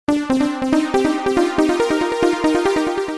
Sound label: Cool Txt Alert